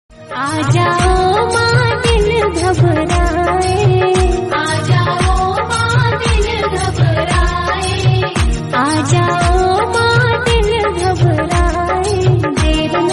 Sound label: Bhakti Hindi